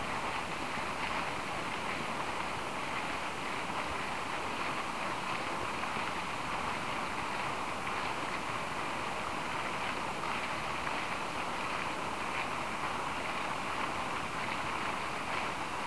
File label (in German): Brunnen; st ottilien; Diessen